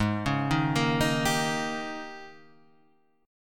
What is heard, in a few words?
Ab Major 7th Suspended 2nd Suspended 4th Ab, down, Guitar, Half Step Down